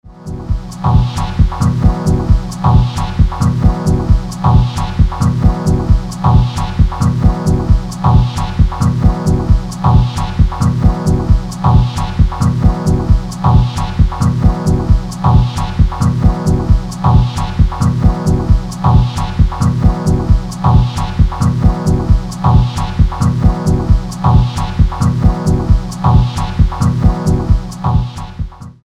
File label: Loop